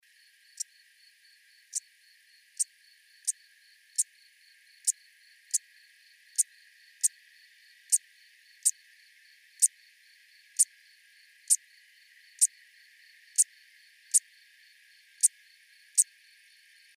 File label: Eupholidoptera schmidti; BIOAC ORTH